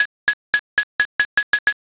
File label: hyoshigi roll